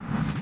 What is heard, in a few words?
BD Gate FX, Bassdrums; Vengeance Samples